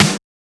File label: Snare; FLPRO